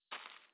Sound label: footstep